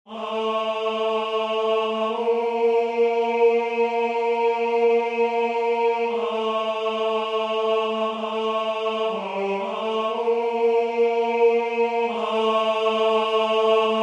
ALL THINGS BRIGHT AND BEAUTIFUL Tenor

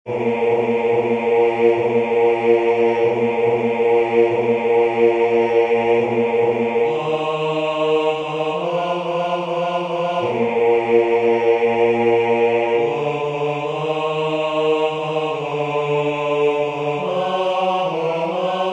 AN IRISH BLESSING Bass

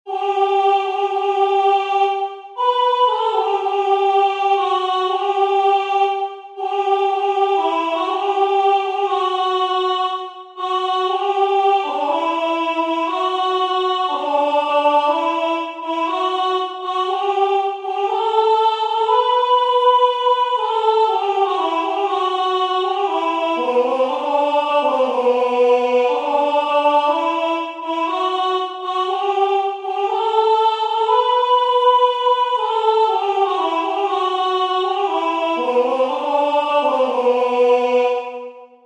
Alto; COME AGAIN; JOHN DOWLAND

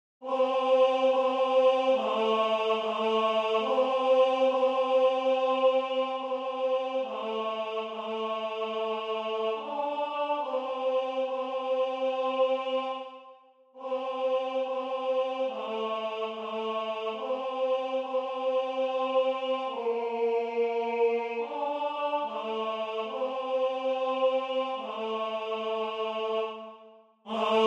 AVE MARIA Tenor; JACOB ARCADELT

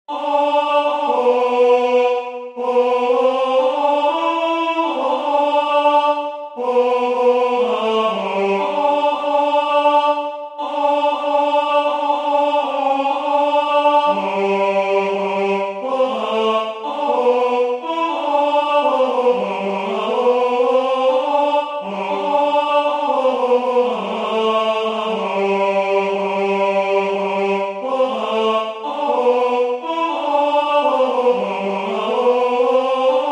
Tenor; COME AGAIN; JOHN DOWLAND

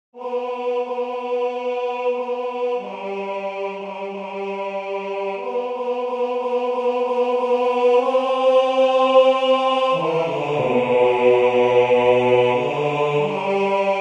Tenor; GOSPEL TRAIN

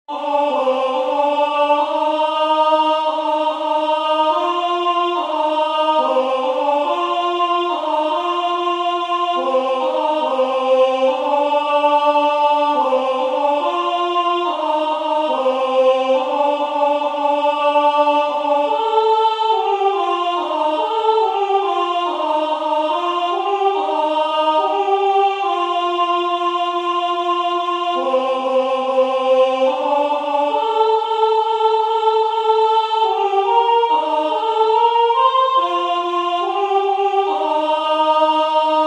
AN IRISH BLESSING Alto

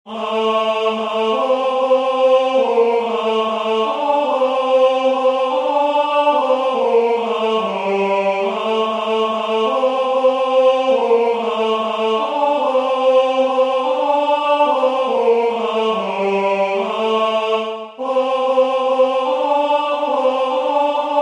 Tenor; ALS ICK U VINDE

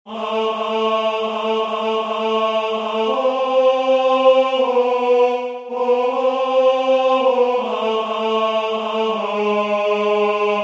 DONA LA PACE Tenor; TAIZE

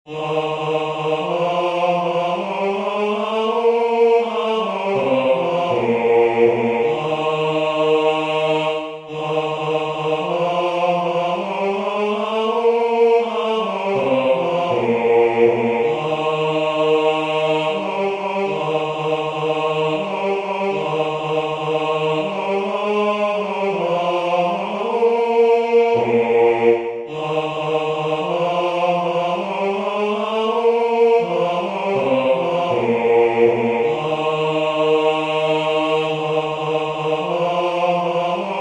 DRINK TO ME ONLY Bass

